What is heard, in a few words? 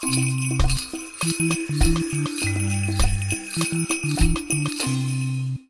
Icebreakers Splash; Daniel Wolfe